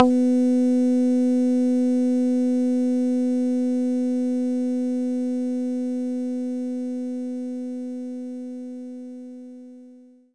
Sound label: Muted Guitar B3; knights try